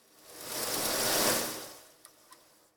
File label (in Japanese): tokeru; easyrpg; Ib